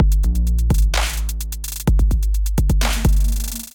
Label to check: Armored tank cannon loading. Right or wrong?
Wrong